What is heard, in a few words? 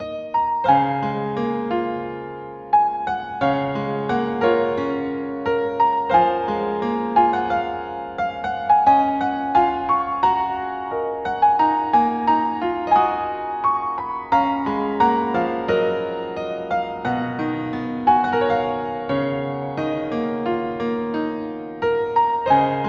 piano